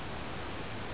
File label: wind